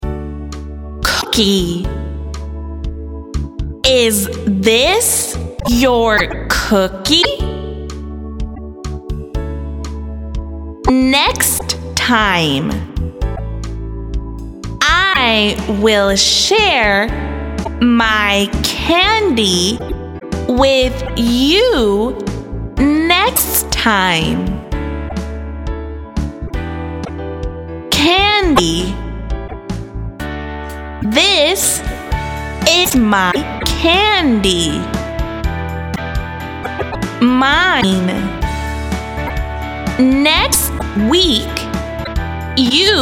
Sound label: voc